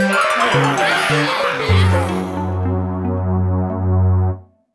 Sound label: Mus End Of Round Stinger Zombie Lose